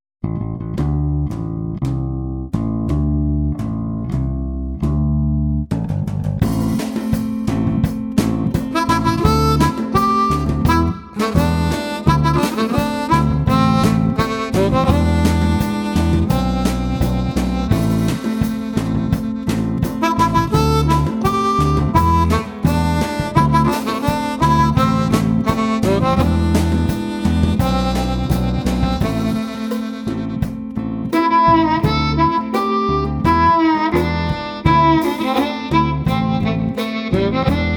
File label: Spiral Standard, harp